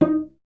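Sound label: STR VC D#0, strings cello; Miroslav Vitous; Solo Instruments VOL-1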